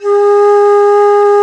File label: ALTO BANS, Bansuris; Winds; E-MU Producer Series Vol. 7 – Old World Instruments